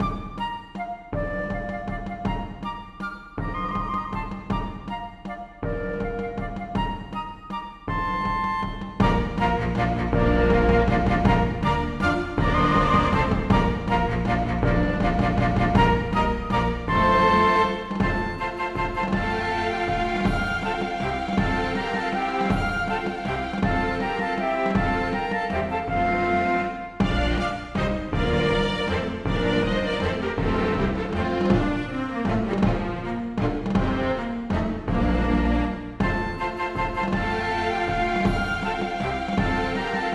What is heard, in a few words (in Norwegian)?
Menuet for fløyter, strykere og slagverk; Aamodt